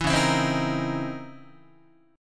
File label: C sharp Minor Major 13th C sharp Guitar; C#mM13 strummed; 7,9,7,10,7,9; JGuitar